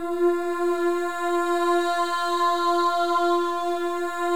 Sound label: MOVING V3, Symphony of Voices; Spectrasonics